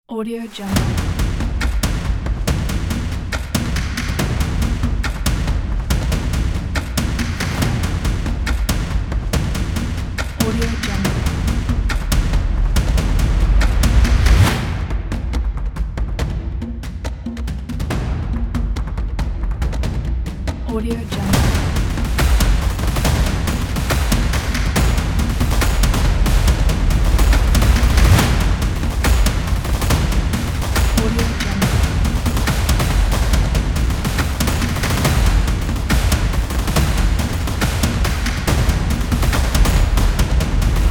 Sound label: the africa percussions